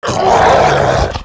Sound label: Howlfiend Attack